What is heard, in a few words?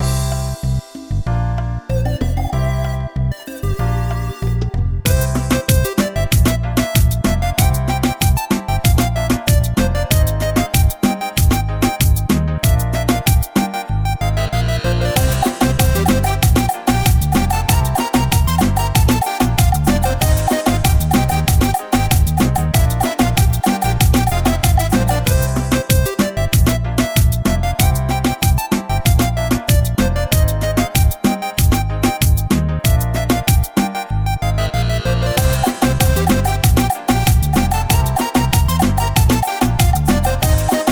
cumbia; timmy turners globe run 2023